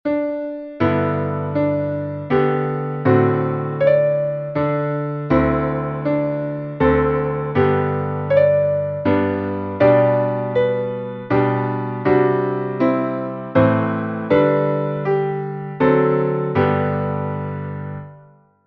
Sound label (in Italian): piano in sol